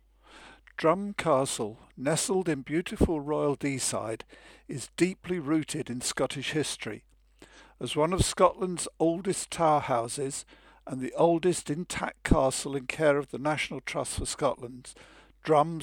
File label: Drum Audio